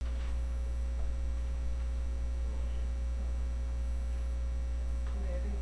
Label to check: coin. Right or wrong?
Right